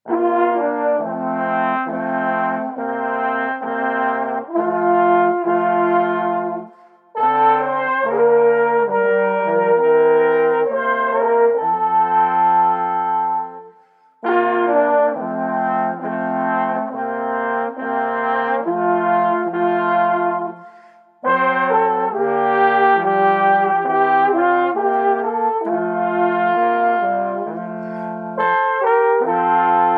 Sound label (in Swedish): Fjäriln vingad, 4 blås